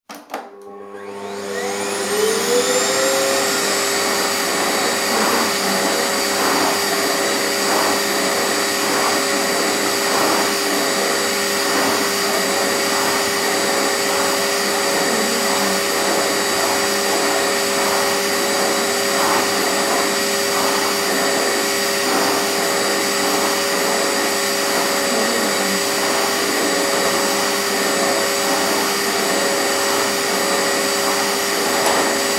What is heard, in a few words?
vacuuming carpet